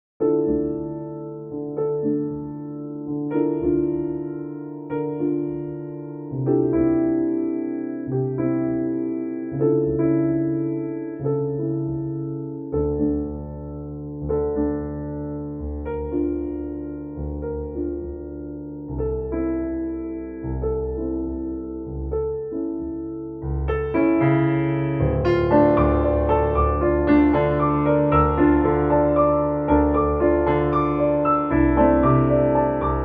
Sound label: concert grand; fazioli concert grand